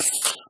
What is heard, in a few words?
creeper; mob; Minecraft